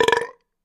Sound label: Belch Deep